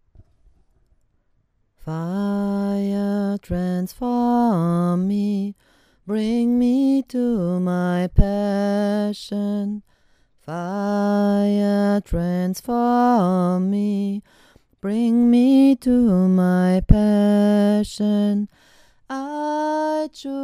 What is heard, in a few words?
Fire transform me sang; Reclaiming Witchcamps USA 2010